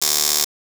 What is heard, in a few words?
perc; goodtakimu drumkit; fl studio; drumkits